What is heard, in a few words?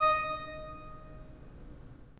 pipe organ, 3-D Audio